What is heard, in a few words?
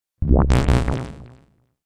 Dubstep Audio Laser; Laser Sound Sound Effects